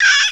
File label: CAW